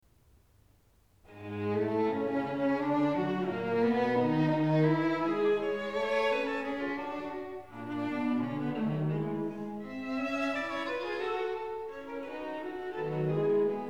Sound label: String Quartet in A minor, Allegro ma non tanto; String Quartet No.15; Op.132; Ludwig van Beethoven